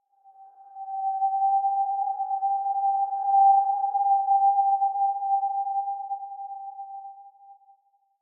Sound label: Jungle Bird Calls - Reversed - Longer And Higher; Hollywood Edge; sound designer's toolkit v2